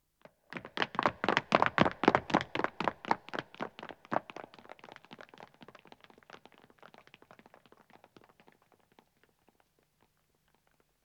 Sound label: Stone Running, 2 Men Depart, footsteps; BBC Sound Effects Library